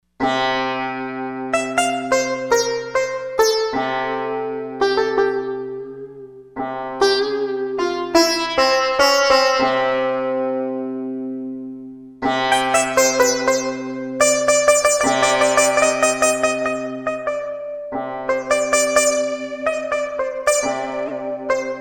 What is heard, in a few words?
Sitar